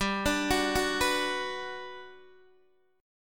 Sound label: G Major 7th, G, down, Guitar, Open D